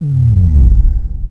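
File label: gulp loop, ships engine; Quantum Redshift; XBOX; kutoo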